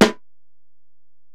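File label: Acoustic Percussion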